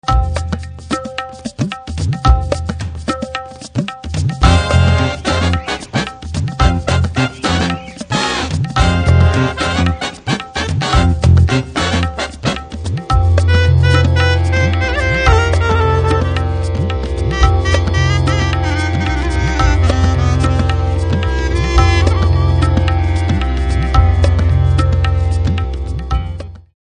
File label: salsa